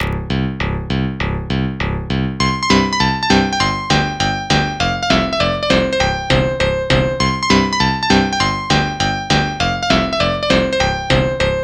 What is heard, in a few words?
Piano Solo; To Saint Veronica Guiliani Op38